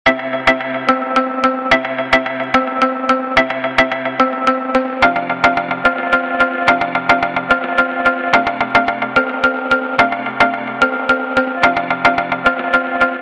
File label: Nice Plucks, ringtone